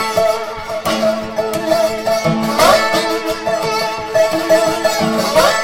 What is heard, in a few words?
dobro